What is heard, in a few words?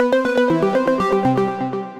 Shimmer Arp, Synth Rhythmic; Ableton; Factory Packs; Build and Drop